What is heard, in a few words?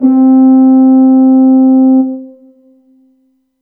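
Kawai K5000W French Horn C4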